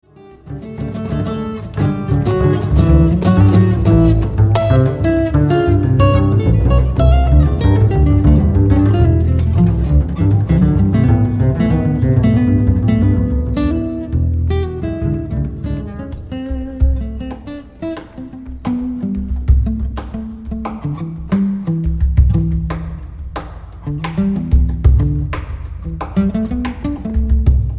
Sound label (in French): voiture bar, acoustic songs